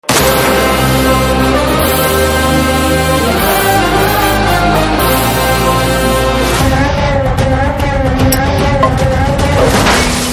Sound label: Trailer BGM Telugu Ringtone; Krack